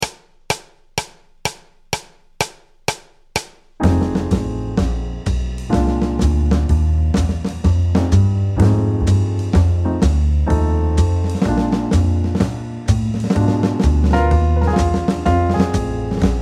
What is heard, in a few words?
Blues for Big Max w snare